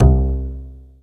STANDUP C3; Greytsounds; Scott Peers Sound Engineering

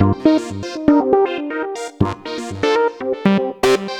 acid sequence; Sonic Foundry (Sony Creative Software); Ugly Remnants VOL-2

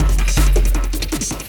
LOOP, Drum & Bass, 160 BPM

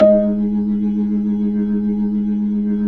B-3, Jazz

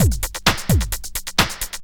drt 130 electro, DRUMLOOPS, LOOP ELECTRO

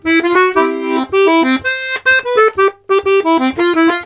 120 GIGUE, Complete Accordions; USB Soundscan vol.40; AKAI

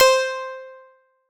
FM Piano C5; Big Fish Audio; Old Skool House; OSH Piano

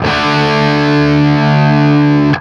5TH D, Guitar; East West; Steve Stevens Guitar Samples Collection

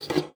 GUITAR FX; Xsample VOL-2

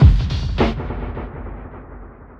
drloop, drumloops, Vinyl Attack; Masterbits

